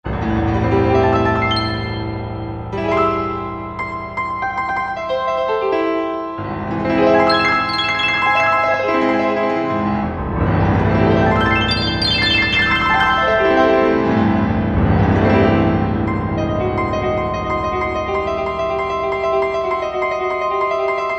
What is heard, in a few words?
Piano Arp, Kurzweil K2000; Kurzweil K2000 Value Pack; Extra Programs; ARPPIANO